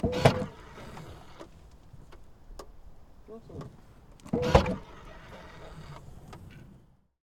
Porsche Cayenne I 4.8 V8 foley exterior trunk open mono; Soundholder